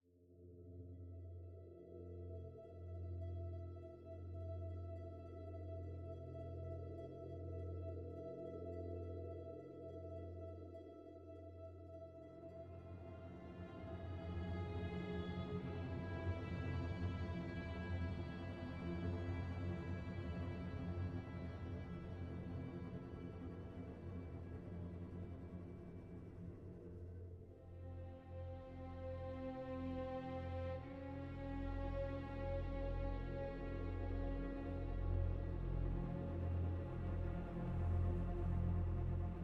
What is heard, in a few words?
Cave Eavesdrop; uncharted 4 a thiefs end ps4 ps5 gamerip 2016